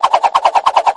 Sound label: freespins info table out; Hungry Shark; Wazdan